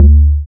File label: log d, amapiano log drums